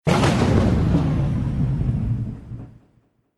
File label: thunder sound; Arc of Templar